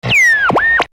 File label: radio